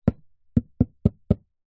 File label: plastic tub empty tap base hand drum rhythm; foley